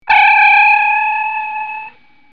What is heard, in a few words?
transporter beep, computer